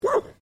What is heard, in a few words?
Animal Dog Blue Healer Bark